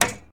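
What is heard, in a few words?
Truck Cherokee Hood Latch; SFX Kit; Trucks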